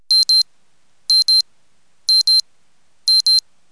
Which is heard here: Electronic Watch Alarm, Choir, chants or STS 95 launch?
Electronic Watch Alarm